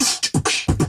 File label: fast pace, Beatbox, Drum loops